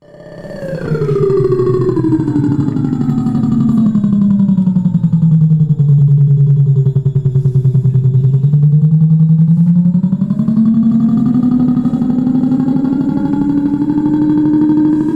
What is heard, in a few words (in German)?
Klang Old Time Pulse Flugzeug